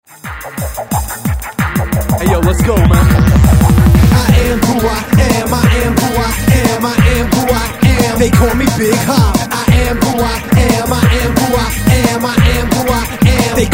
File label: new beats